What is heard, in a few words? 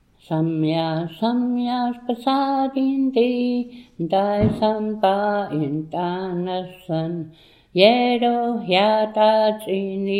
Twinkle Twinkle Little Star